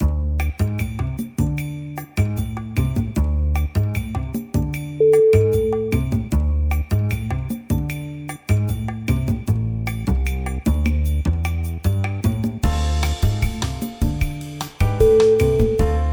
bossa jazz

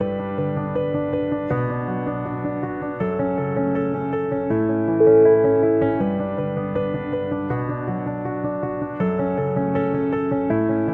lively piano

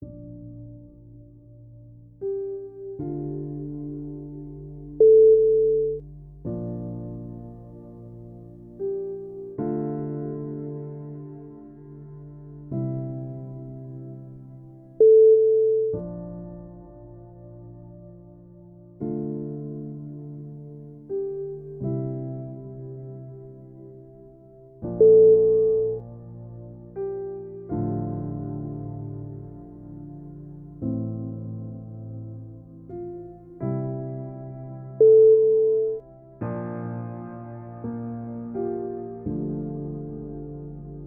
soft emotions

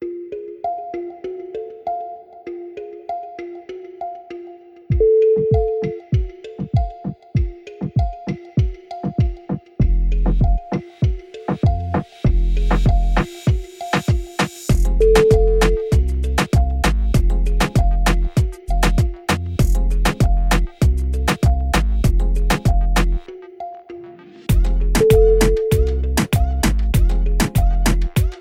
beach madness

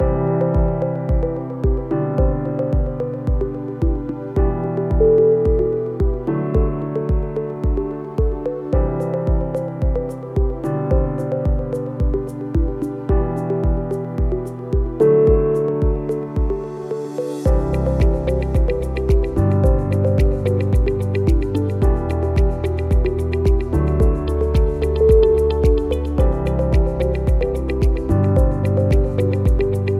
deep ambient

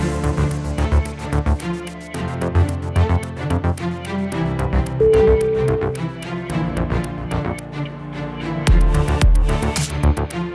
digital corporate

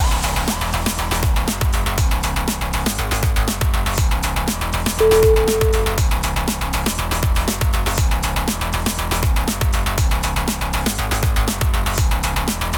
rave dance

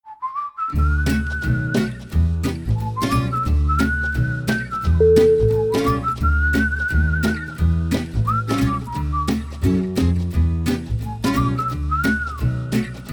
swinging whistle

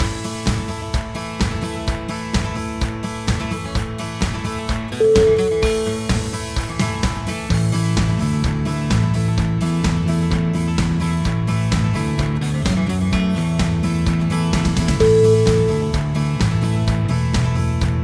cowboy campfire

